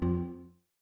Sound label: Speech Misrecognition; Windows; Glass